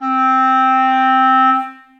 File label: Eb Clarinet Solo Legato; Ableton; Orchestral Woodwinds